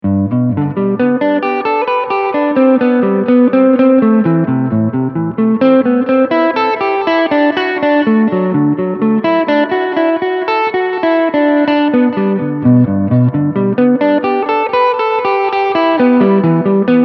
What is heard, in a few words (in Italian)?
arpeggi III posizione